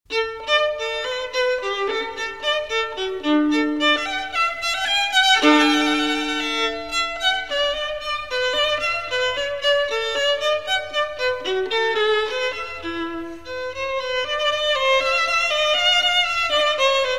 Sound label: Gigue, violin; Suzuki Violin Method; J.S. Bach